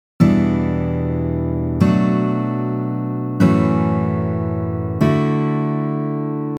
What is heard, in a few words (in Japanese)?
エモい; emo code 4types 3steps